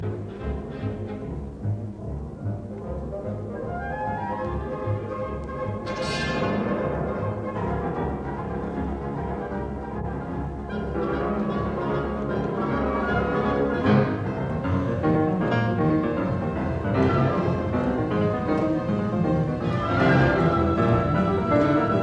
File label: Piano Concerto Tarantella Vivace; Op 39; All'Italiana; in un tempo